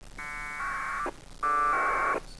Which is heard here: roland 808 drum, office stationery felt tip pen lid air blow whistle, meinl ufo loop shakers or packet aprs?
packet aprs